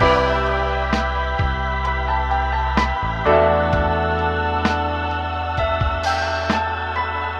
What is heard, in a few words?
full piano and strings and voices sing, Loops Phonky